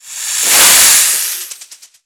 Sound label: Snake Attack